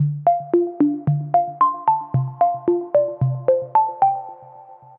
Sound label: AVAS Melody Welcome